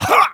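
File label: voice punch combo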